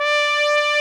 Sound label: trumpet D4; Greytsounds VOL-1